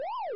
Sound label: siren